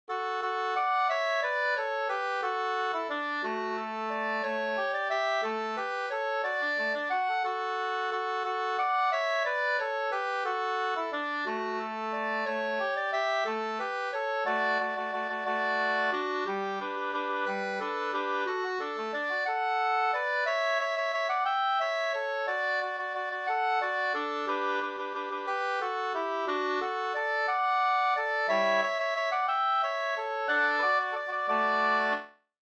vals; Knared

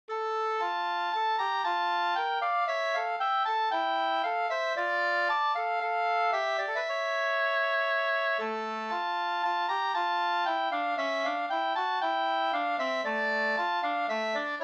ganglat Brudmarsch; Skraddar-Edvards ganglat fr Ludgo SvL

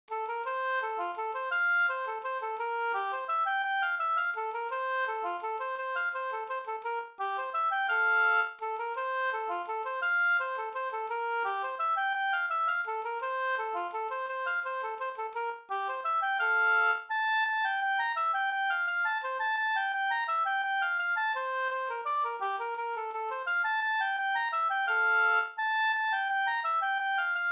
vals

